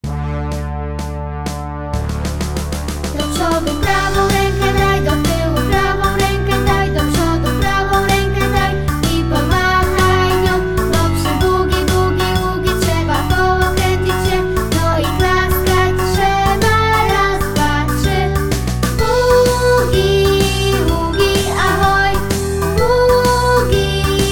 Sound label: boogie woogie